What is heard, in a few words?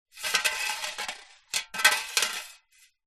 money coins x2 inside metal tin roll; zapsplat